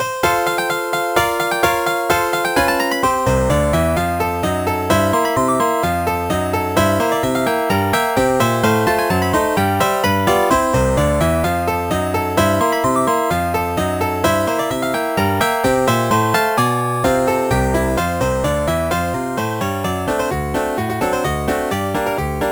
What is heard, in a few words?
piano; cleopha; Sonix Music Driver